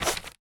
trade up envelope slide in

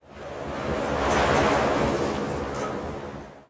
wind gust; Garry's Mod; tf2

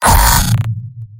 m pain sharp; soldier; tf2; garrysmod; mvm

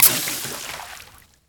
dodge can open; Garry's Mod; tf2

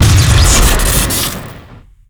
reserve shooter crit; tf2

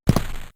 walk step, footsteps; vinagre npcpack

